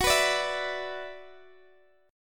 F sharp 7th Suspended 4th Sharp 5th, Guitar, Open G; x,x,16,16,15,14